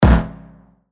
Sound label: PERC E Battle, percussion; revealed percussion vol 2